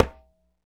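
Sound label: New Conga Slap; Roland BK-5; Percussion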